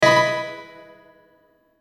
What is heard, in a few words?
Hit Musical Delay Reverb High; Blastwave